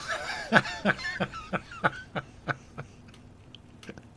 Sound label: jingles